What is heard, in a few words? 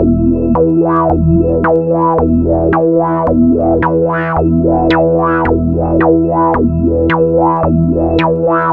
UR philter (two note); Sonic Foundry (Sony Creative Software); Ugly Remnants VOL-2